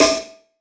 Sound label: One Shot Snare, Drum N Bass - Percussion; Sonic Foundry (Sony Creative Software); Knutrix Drum & Bass